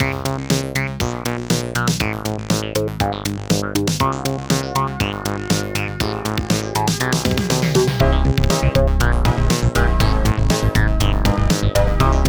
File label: Instrumental